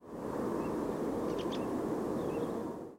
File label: ring ouzel squeaky call